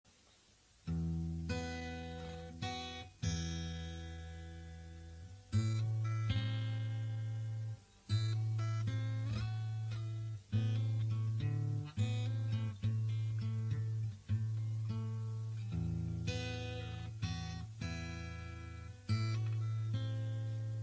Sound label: Guitar Ballad